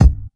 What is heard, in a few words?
rich lows bass drum sound f sharp key